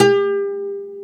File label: Oud G3; Planet Earth; Digital Sound Factory Planet Earth